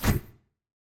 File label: mob formation stack